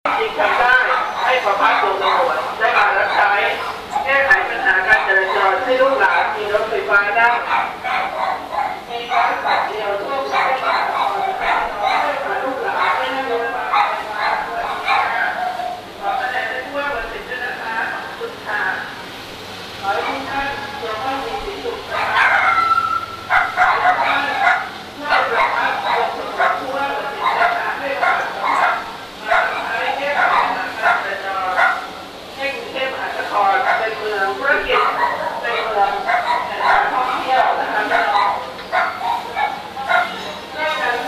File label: bankok dogs and propaganda, Thailand street recordings